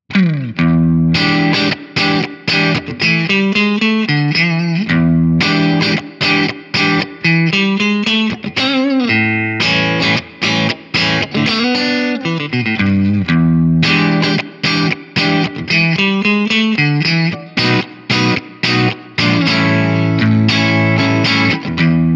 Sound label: breakup; jensentone